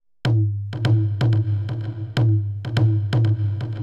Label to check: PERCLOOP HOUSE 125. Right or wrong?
Right